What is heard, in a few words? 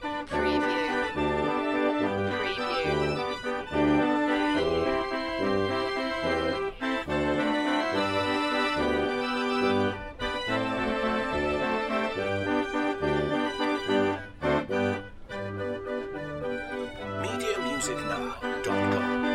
Carousel Music Fair Organ